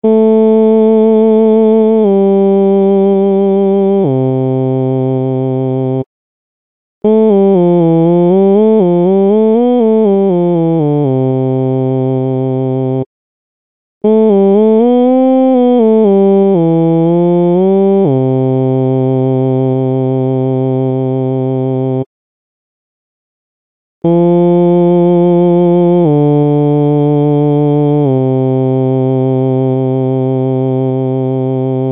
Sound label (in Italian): passaggi baritono; cadenze